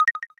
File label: coin; frontline